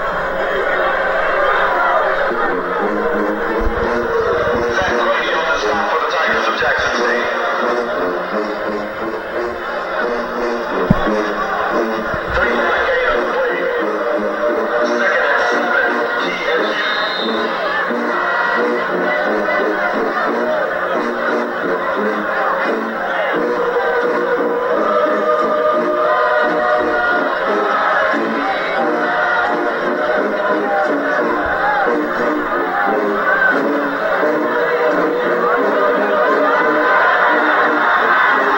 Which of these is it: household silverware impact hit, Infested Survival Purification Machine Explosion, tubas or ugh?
tubas